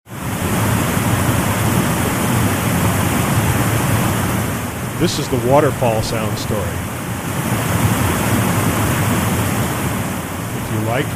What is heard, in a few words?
waterfall